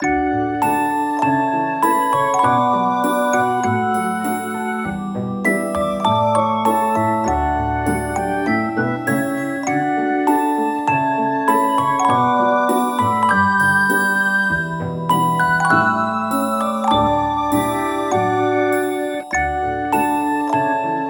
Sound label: Gameplay Lullaby; Everybody 1-2-Switch!